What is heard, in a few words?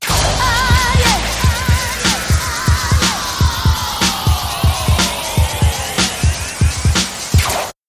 Ah Yeah Sweep